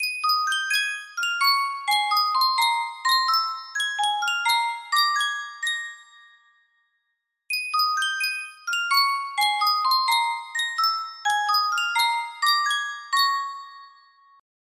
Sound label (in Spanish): yunsheng caja de musica